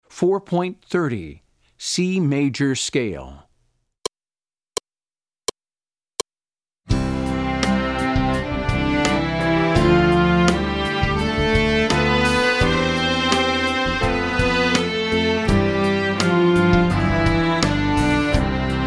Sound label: C Major Scale